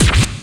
System Scratch, E-MU Proteus-2000

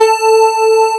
SYN, SYNTHESIZER; DIGI WAVE #1

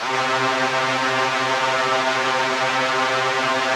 SPACE STRINGS, Yamaha VP-1; Music Store

